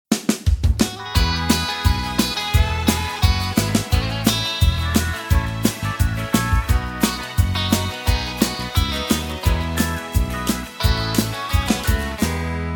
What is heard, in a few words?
(E To F) No Backing Vocals